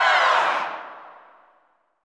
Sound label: CHO FALL, CHO Falls; Sound & Vision - Gigapack I CD 2 (Roland)